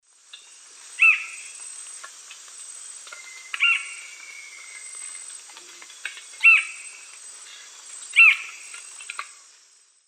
Cercomacra cinerascens, call; S. America; Eastern Lowlands

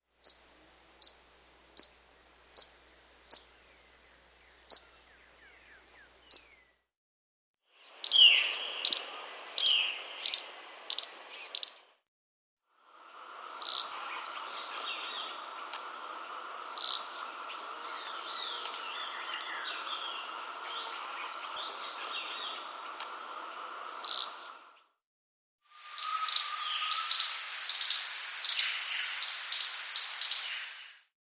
Spotted Tody-Flycatcher; Todirostrum; S. America

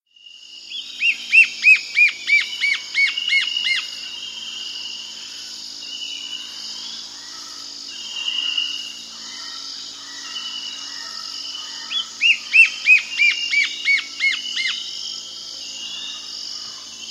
White-Flanked Antwren, S. America, Natures Songs

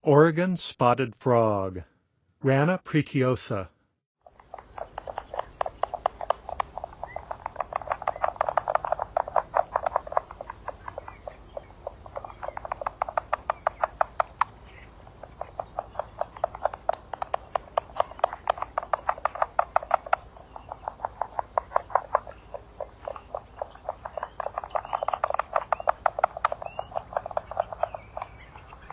Oregon Spotted Frog; North America; Songs of Frogs & Toads of North America

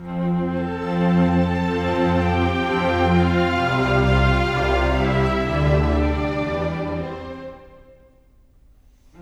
ORCHESTRAL

